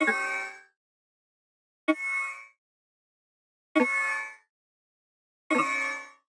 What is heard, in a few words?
Digital Click Negative